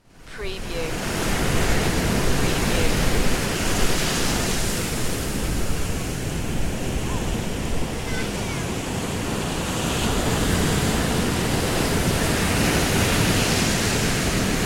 Waves Ocean

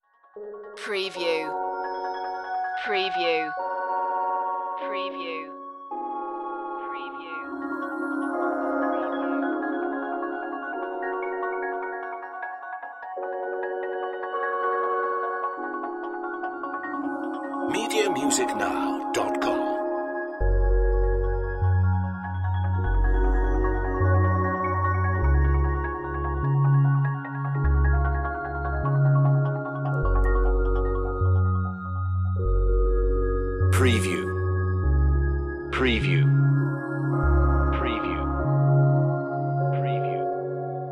no glitches underscore

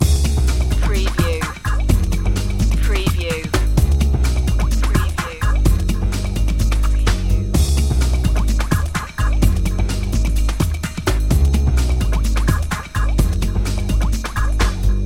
funk loop

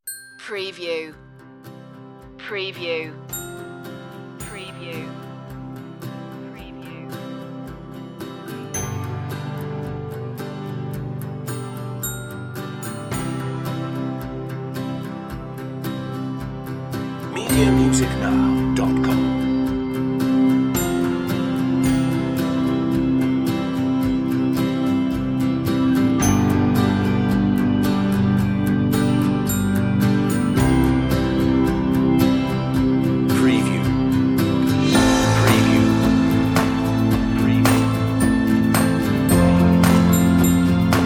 bell lead

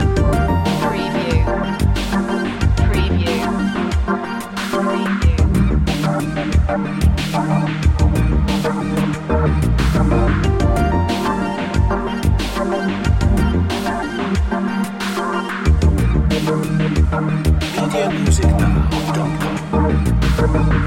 technology progress loop

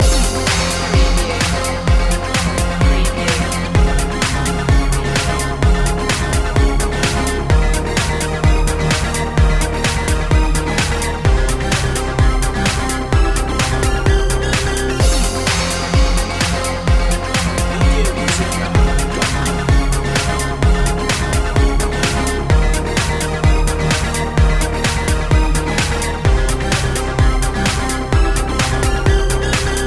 friday night loop

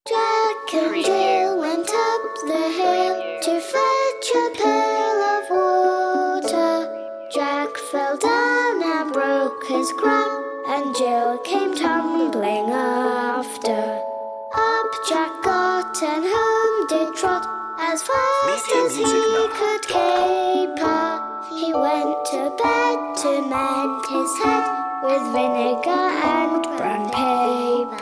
jack and jill children vocals

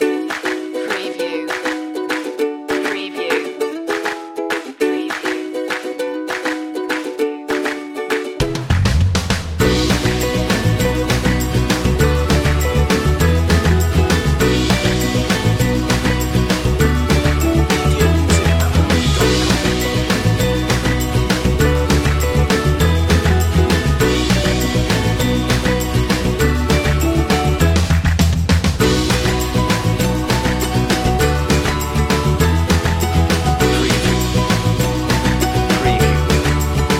happy uke

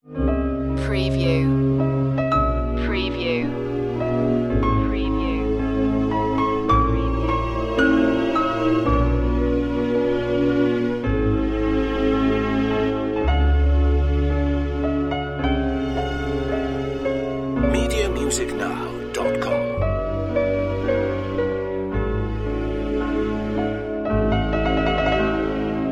orchestral romance

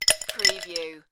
Ice Cubes, Drop Two Ice Cubes Into A Glass

